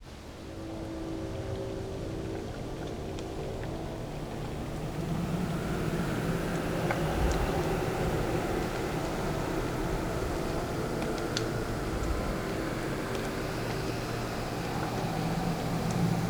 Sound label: Swamps Amb; Volkolak The Will of Gods